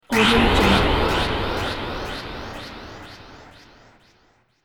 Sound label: ufo space time jump